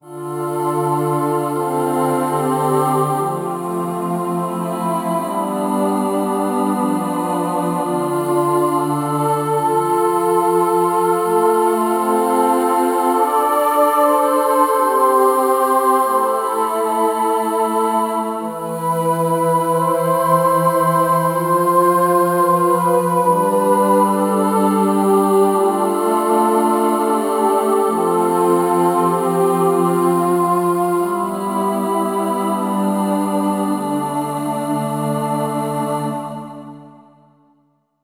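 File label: Harmony Girls, synth; KORG OASYS; oasys assault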